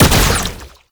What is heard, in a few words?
Proto Infested Brachiolyst Projectile Fire; Warframe; Abilities